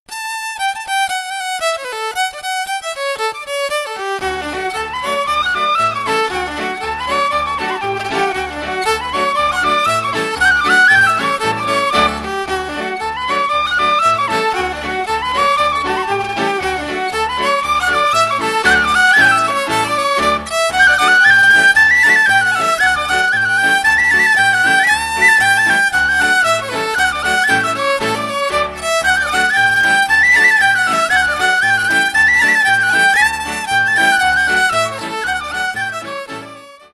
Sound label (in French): danse irlandaise